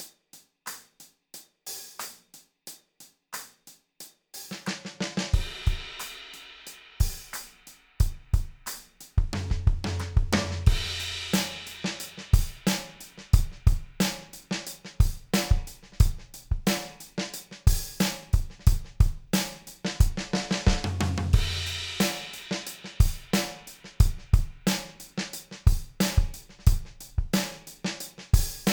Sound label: drum